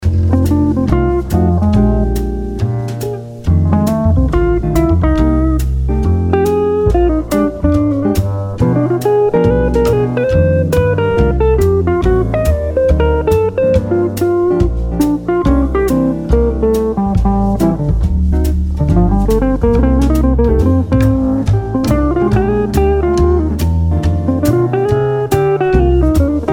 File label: bebop blues